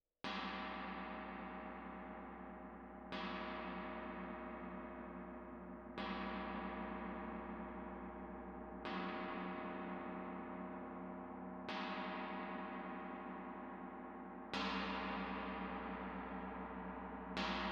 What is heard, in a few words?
Tam-Tam Close